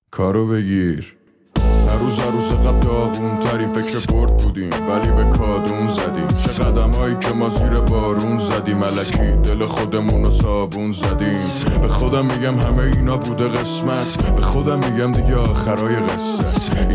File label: Beatbox